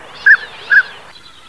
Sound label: Anas acuta; pticy; utki